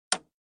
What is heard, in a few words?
Click